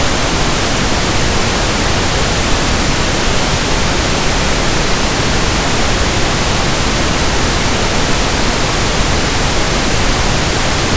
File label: pink noise